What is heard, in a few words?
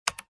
Keyboard key press